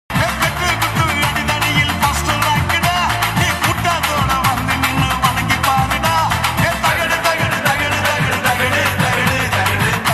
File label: song, mass; trichy to puthukottai bus; buslover